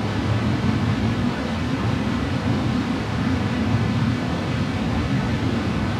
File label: Panned Noise, non tempo loops; stereo toolkit samples